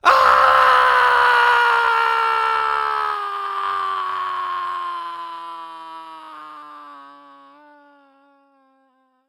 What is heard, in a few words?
Cartoon Human Male Screams While Falling; AdobeCC